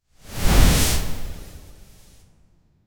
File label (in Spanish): un suave deslizamiento de, cloth